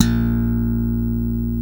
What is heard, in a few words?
BRITE BS A#2, FINGER BS